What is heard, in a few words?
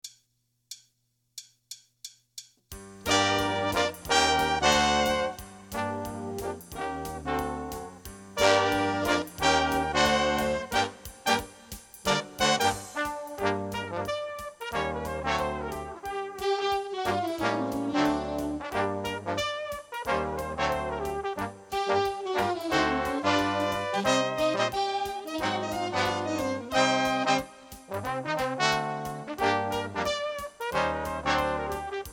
guitar bass cut